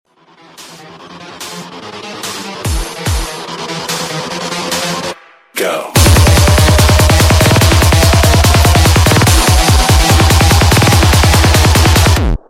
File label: hot trance, ringtones